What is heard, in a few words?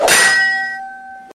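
Sword Clang Notification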